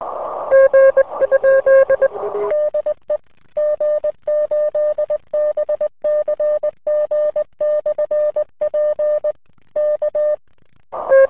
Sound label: cw qso